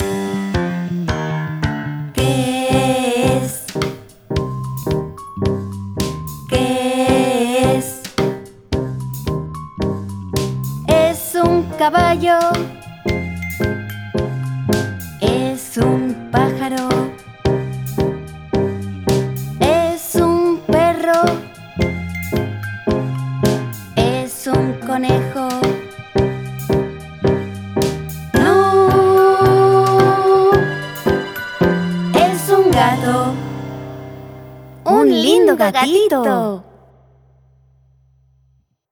song spanish

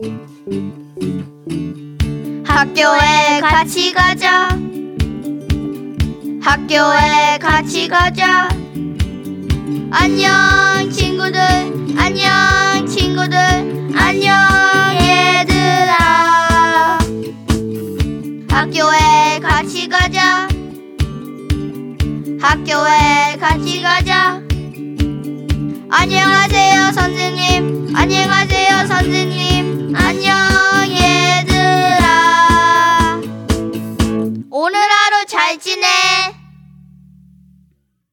song korean

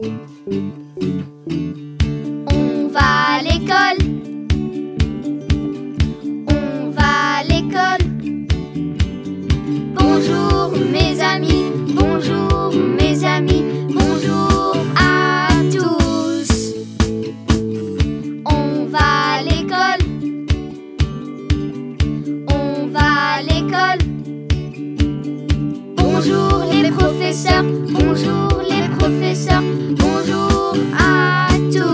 song french

